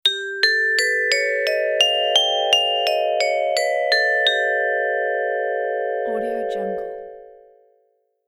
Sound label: story time dreamy